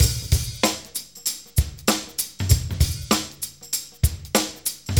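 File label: 96 bpm, Funky Drums; AMG; Now CD-ROM (Roland); Neil Conti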